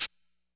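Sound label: classic hh Shaker; Hip Hop; STYLE KITS; Classic Hip Hop Mega Kit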